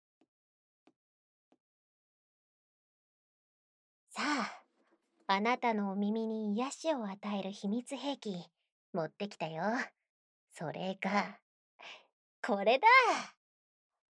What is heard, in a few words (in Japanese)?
スライム両耳ケア（スライム）; 科学の力